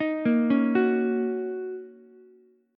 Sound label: D sharp Minor 7th, Ukulele; arpeggiated; JGuitar